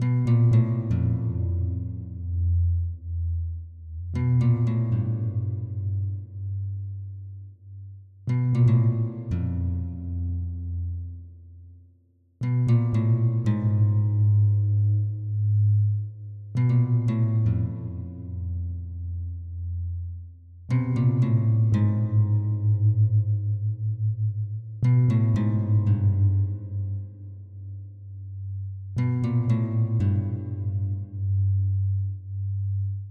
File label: guitar